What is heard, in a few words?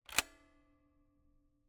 Camera, Kodak EK2 Instant, polaroid, large, plastic, springs, release; Eiravaein Works; Vaeyan IV